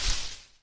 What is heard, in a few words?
bush hit; hits